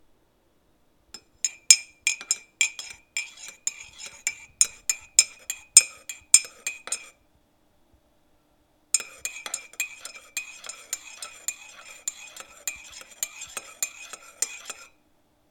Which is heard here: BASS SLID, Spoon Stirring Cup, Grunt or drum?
Spoon Stirring Cup